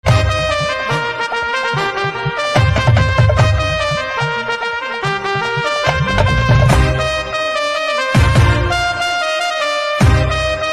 Saxophone Instrumental Ringtone; punjabi instrumental ringtone

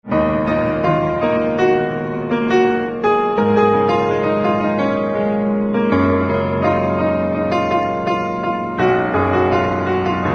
Piano

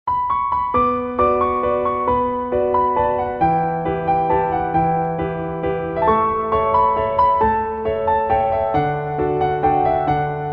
Piano

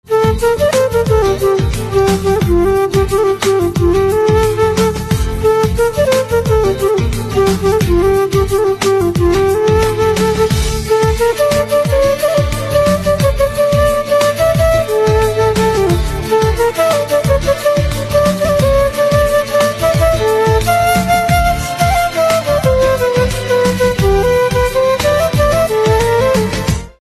Flute Instrumental Ringtone

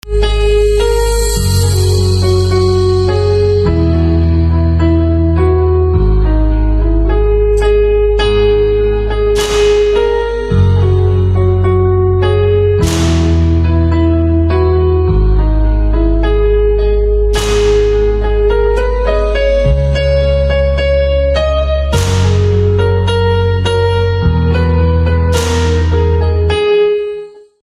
Piano Instrumental Ringtone

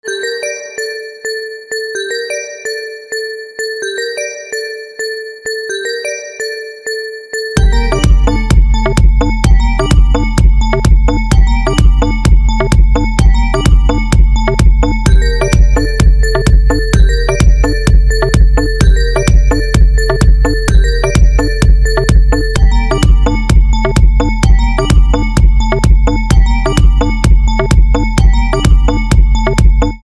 Xylophone Instrumental Ringtone

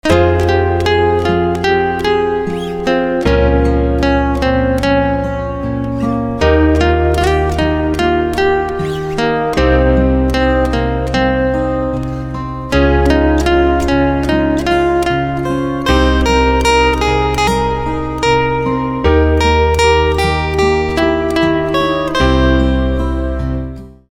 Guitar Music Ringtone; Ashique 2

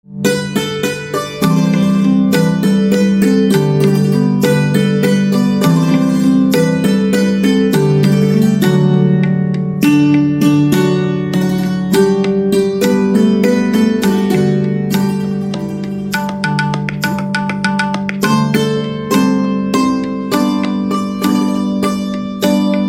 Santoor Instrumental Ringtone, devotional

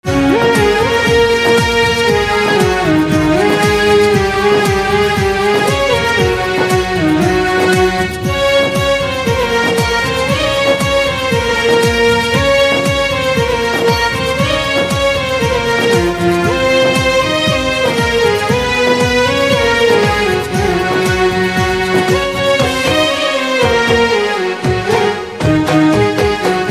Instrumental Ringtone, sytrus synth

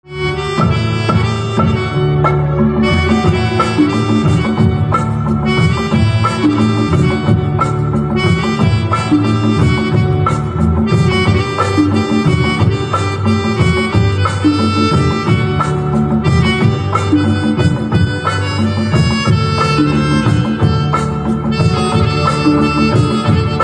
Pianica Instrumental Ringtone

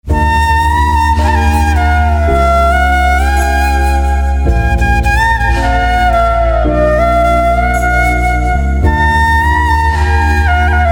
Flute Instrumental Ringtone

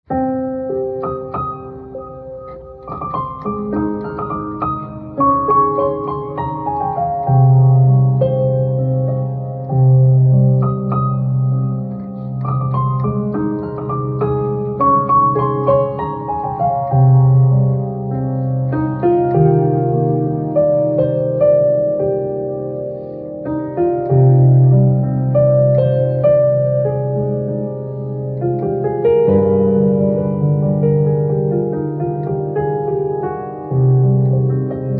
Piano Music Ringtone

